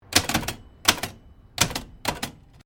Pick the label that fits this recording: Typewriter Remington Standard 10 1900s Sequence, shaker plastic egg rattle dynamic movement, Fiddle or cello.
Typewriter Remington Standard 10 1900s Sequence